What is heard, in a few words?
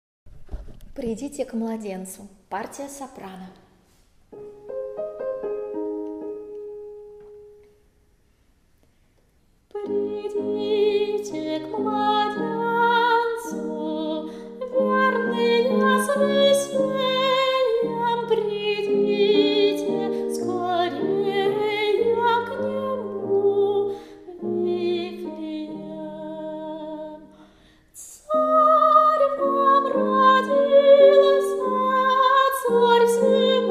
soprano; Pridite k Mladencu